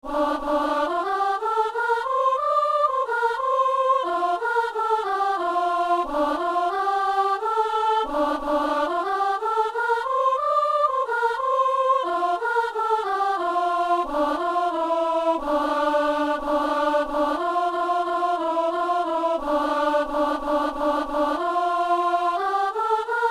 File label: soprano; Bénis le Seigneur, O mon âme